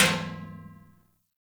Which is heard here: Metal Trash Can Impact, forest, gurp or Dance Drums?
Metal Trash Can Impact